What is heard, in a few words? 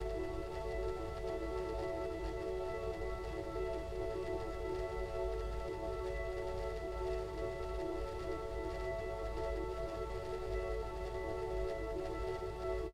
useless pad E